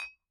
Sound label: Bottle Struck